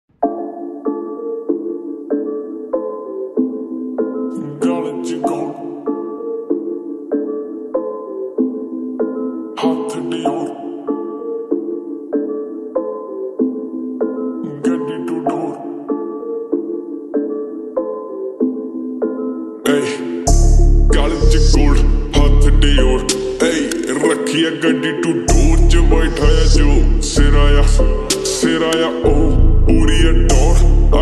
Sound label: slowed reverb